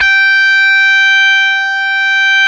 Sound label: GTR, Dirty; Club-50 - Foundations; Paul-Stack